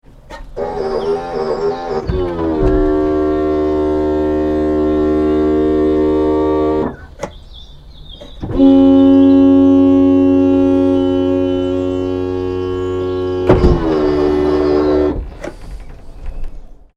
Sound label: Car Electronic Boot - Open and close - tailgate; Cars; Vehicles; Tom Hutchings